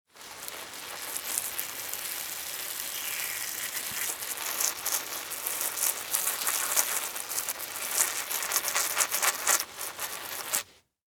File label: Household Pesticide Spray Narrow; Yard Tools